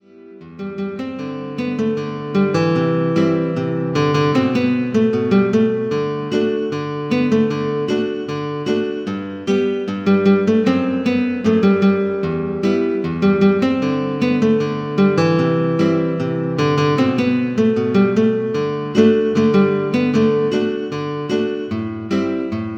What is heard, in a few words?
synth